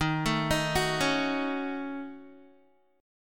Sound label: D sharp Minor 7th Flat 5th D sharp down Guitar; arpeggiated